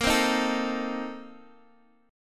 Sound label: Bb Major 7th Suspended 2nd Suspended 4th, Guitar, DADGAD; x,x,8,5,6,7